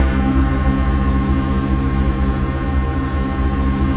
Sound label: ATM TONAL; Best Service - XXL Production Effects